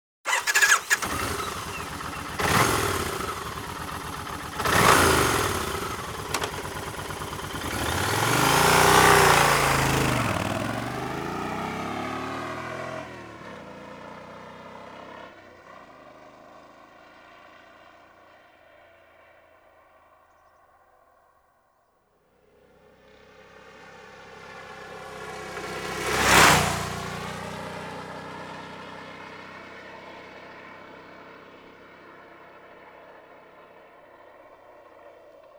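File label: Kawasaki VN 1500 Classic Tourer, motorcycle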